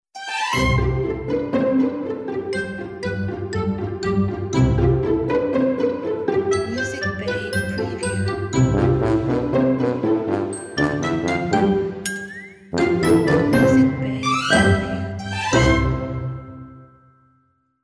Comical

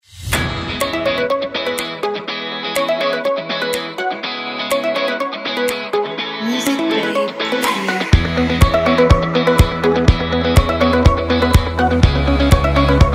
Energetic Inspiring Upbeat Pop

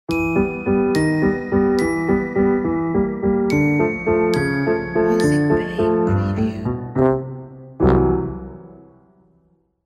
Halloween Ident

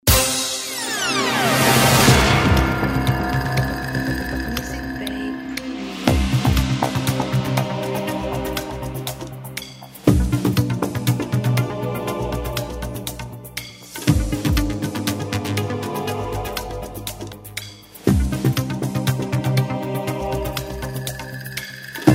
American Jackpot Tension Bed

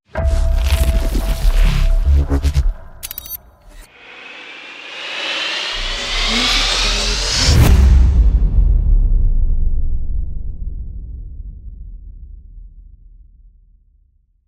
Digital Impact Glitch Logo

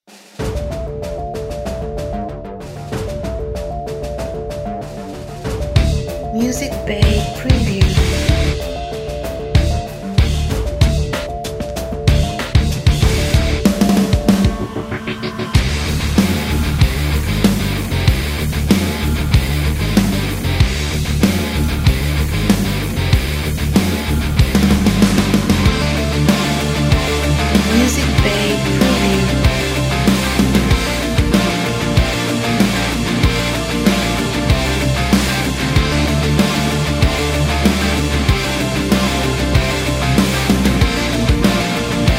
Modern Metal

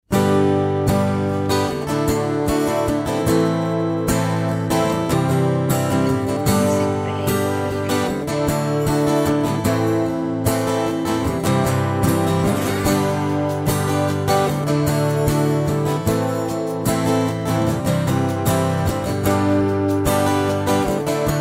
Acoustic Rock Ballad

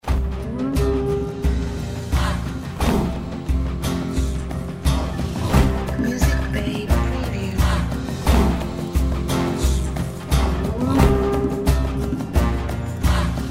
African Elephant

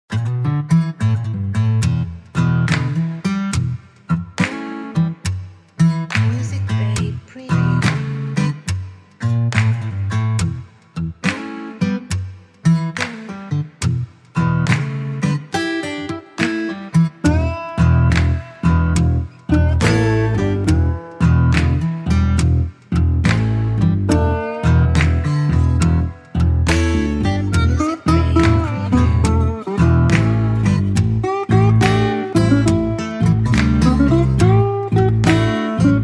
Lazy Blues

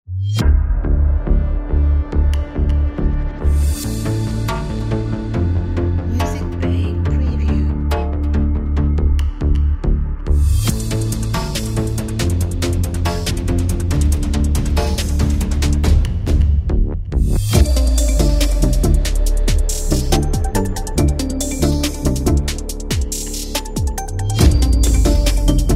Suspenseful Futuristic Cinematic

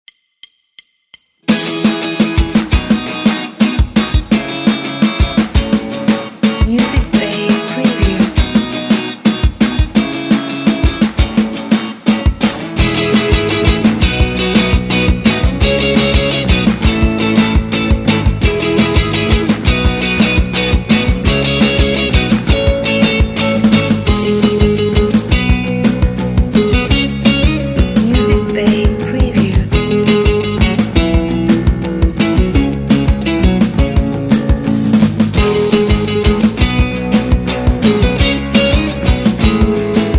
Positive Pop Rock

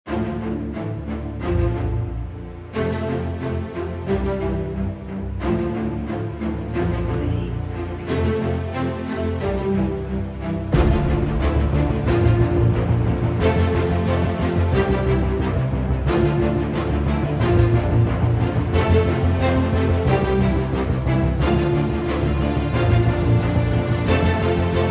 Cinematic Inspiring Trailer

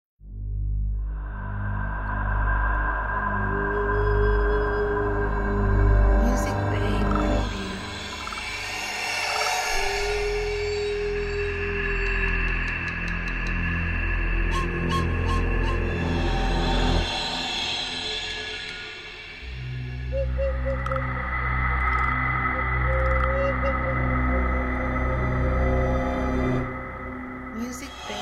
Scary Forest

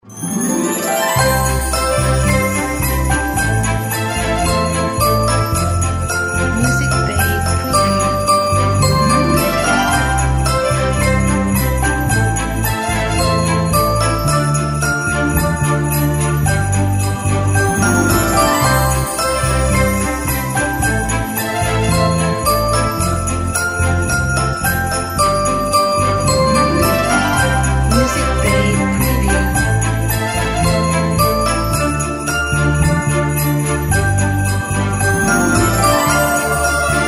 Cute NewYear Melody

